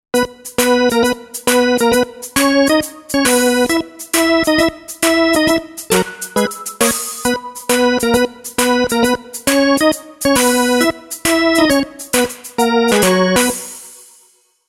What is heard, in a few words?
jingle